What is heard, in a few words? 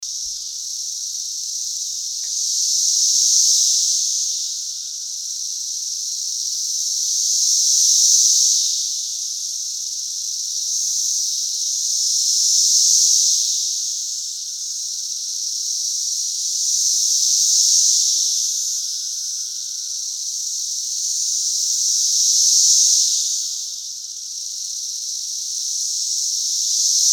cicadas arboretum